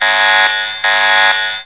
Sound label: SYS ALERT